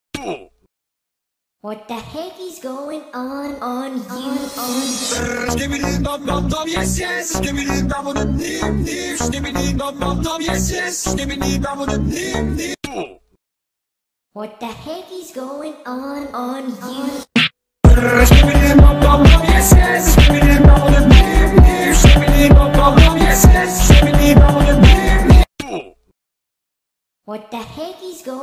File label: phonk remix